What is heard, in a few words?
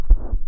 single cycle